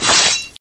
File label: Wolverine Claws